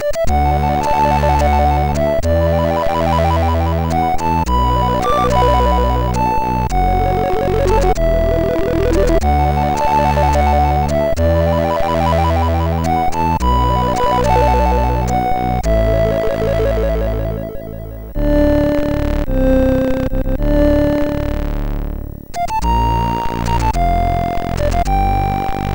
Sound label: Sax, MOS6581R3